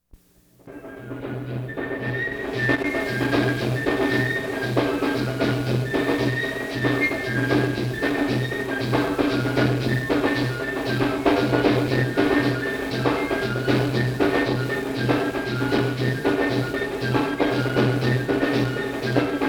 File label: John Canoe Music; Where in the World Is Carmen Sandiego